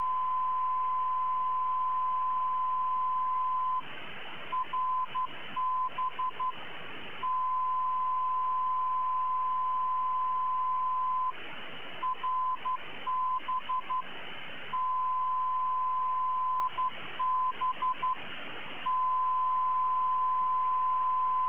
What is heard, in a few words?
BEACON